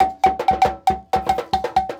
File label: 120 wood